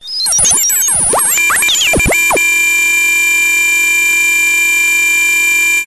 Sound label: science fiction robot or computer malfunction death; zapsplat